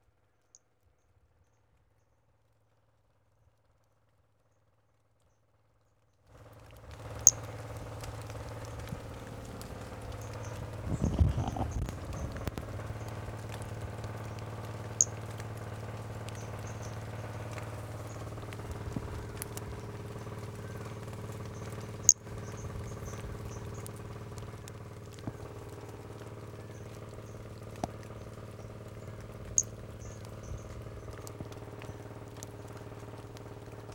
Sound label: Brachycephalus albolineatus